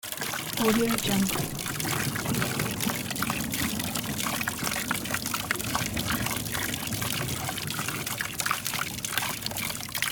water drips into a water barrel with distant thunder